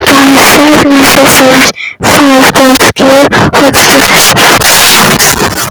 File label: jump fart